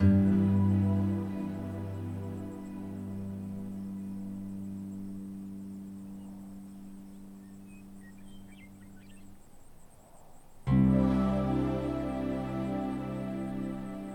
Loop; Mixolumia

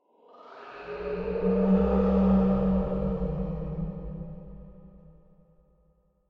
Eerie Distant

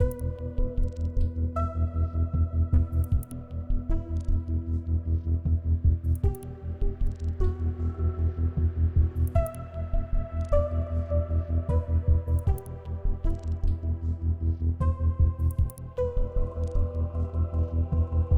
Gridnodes 8CH 77BPM

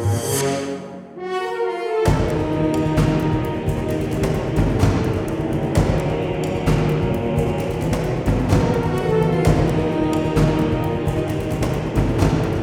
Dungeon; nexomon extinction 2020 pc gamerip

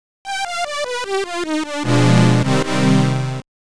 Game Over Sound; Dragon Buster

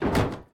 robot step; teardown; ps5 windows xbox series xs

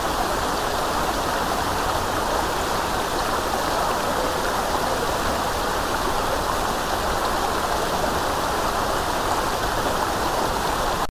waterfall tall; The Sims 3; windows gamerip 2009

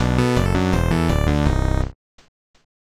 Level Lost

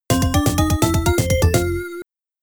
Jungle Level Complete; Brian the Lion; Amiga

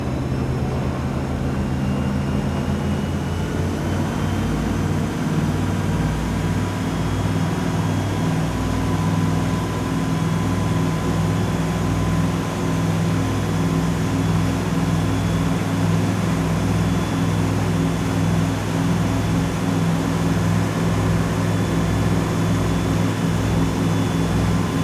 vehicle mediumboat drive

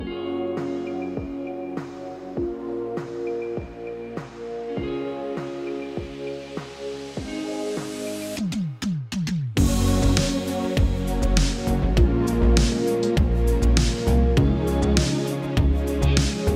Radio Song; Nancy Drew: Midnight in Salem

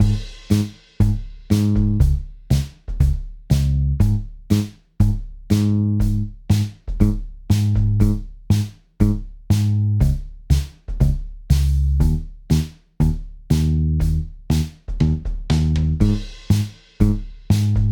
BGM; WoodpeckerSong

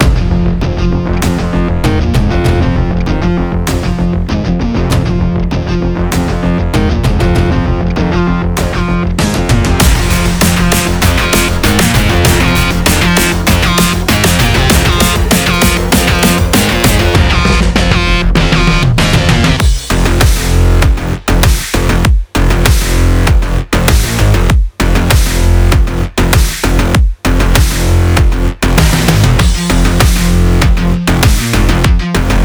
Powerful Electro Agressive sport; quick race linux macos ps4 switch windows gamerip 2021

